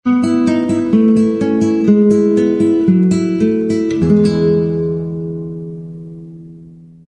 classical guitar accent pastoral

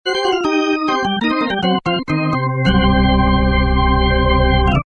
organ boogie

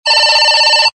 comm walkie talkie single ring

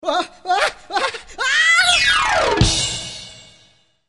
Falling Down Alert Cartoon

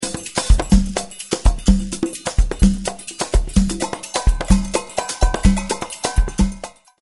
Carnival Rhythm

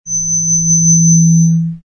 microphone feedback

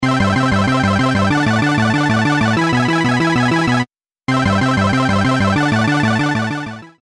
Super Video Game Music

